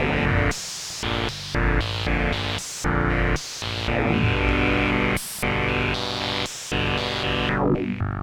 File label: Bb pulse 114